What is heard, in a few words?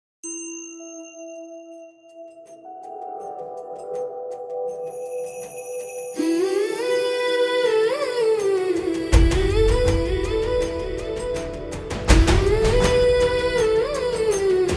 Train Song